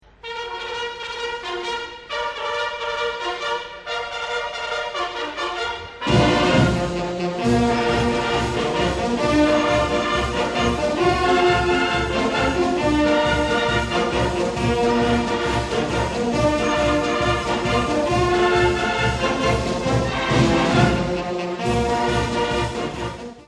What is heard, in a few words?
military escort